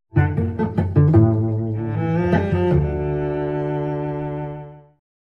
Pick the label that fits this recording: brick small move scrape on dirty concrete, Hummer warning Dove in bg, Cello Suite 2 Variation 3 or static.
Cello Suite 2 Variation 3